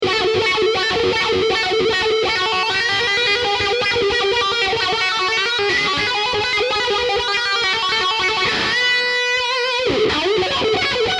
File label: wah wah